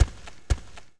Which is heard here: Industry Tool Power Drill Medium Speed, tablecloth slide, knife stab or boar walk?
boar walk